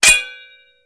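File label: Sword Clanks Single; swords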